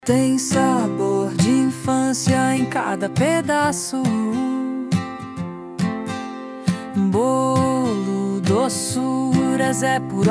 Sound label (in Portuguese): Jingle; Docuras Prod.